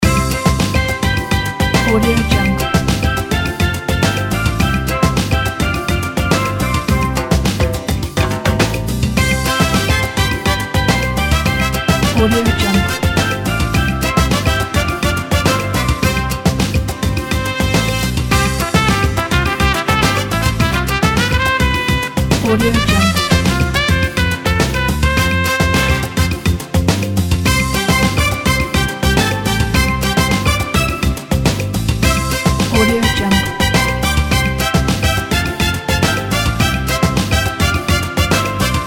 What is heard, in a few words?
tropical island happy loop